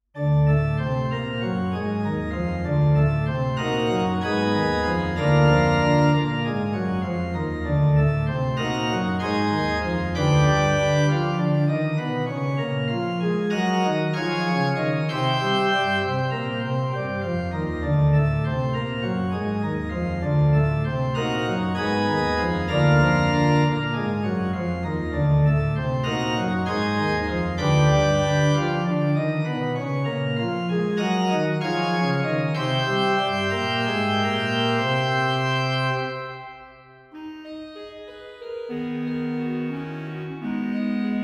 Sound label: postludium, organist; velten; organist online recordings